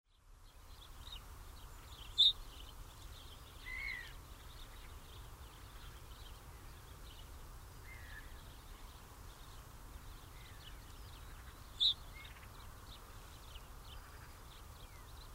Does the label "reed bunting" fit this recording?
Yes